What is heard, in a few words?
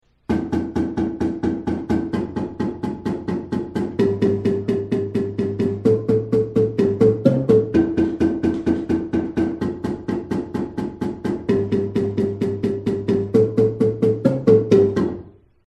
bass chorus, marimba